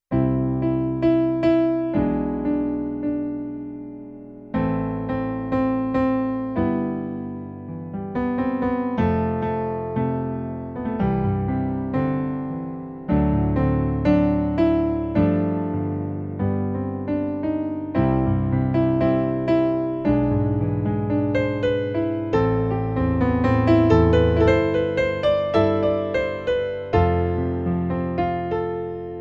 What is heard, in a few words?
Jolly Old St Nick, intermediate piano solos; christmas eve songbook